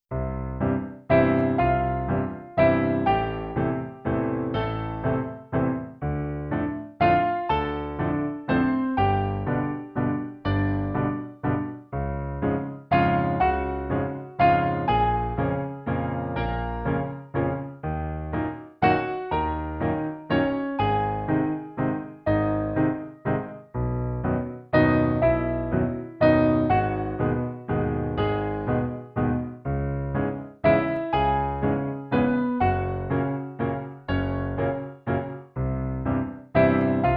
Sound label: piano